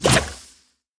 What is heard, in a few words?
dragons enemy acid alfa attack